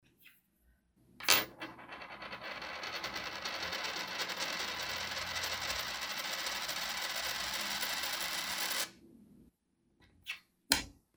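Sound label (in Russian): долго крутится средняя монетка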